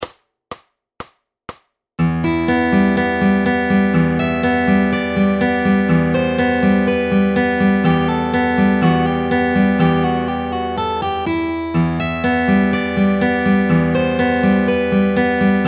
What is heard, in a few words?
Fingerstyle Easy Classical Full 123bpm